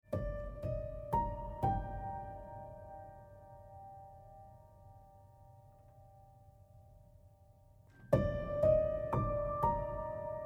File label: Exploration General Piano Fragments